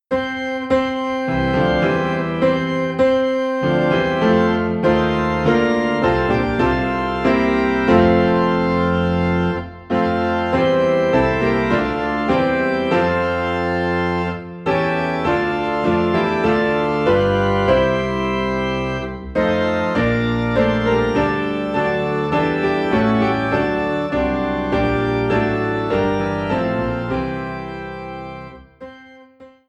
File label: Piano and Organ in F; Rejoice Ye Pure In Heart